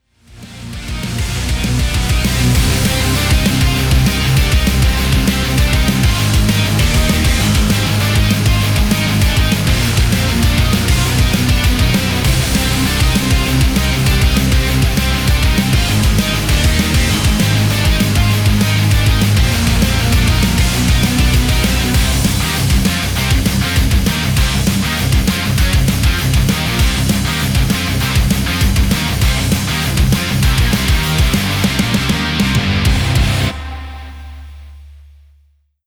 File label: Heavy Metal; Heavy Metal Challenge Snippet; super crazy rhythm castle